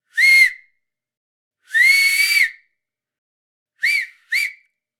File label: Whistle Human